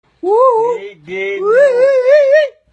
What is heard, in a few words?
uouououo